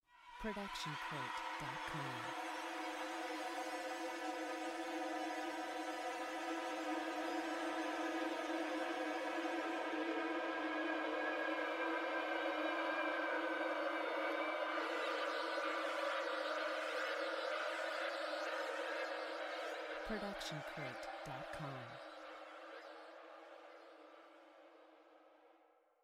howling strings

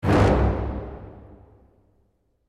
low dramatic short; soundscrate

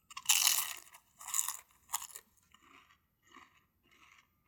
chip crunch chewing closed; soundscrate

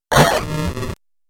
computer glitch

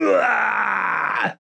man injured scream; soundscrate

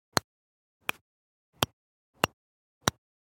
golf club putter stereo; soundscrate

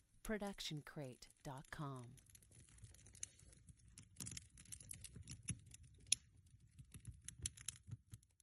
Outdoor Fire; SoundsCrate

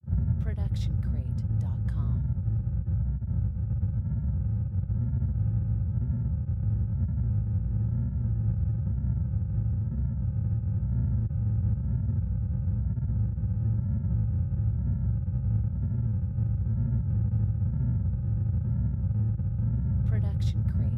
space ship engine ambience; soundscrate

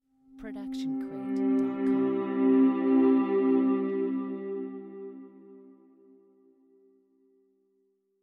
ebow logo; SoundsCrate

